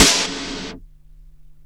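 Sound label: snare processed, Disco House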